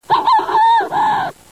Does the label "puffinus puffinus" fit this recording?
Yes